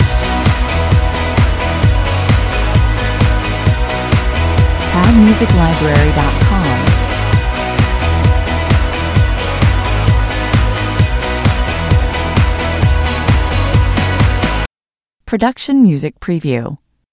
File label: neon guitars loop